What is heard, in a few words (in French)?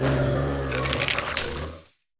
Cri; cris EV